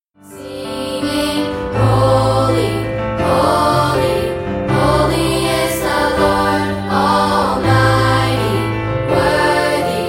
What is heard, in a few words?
Piano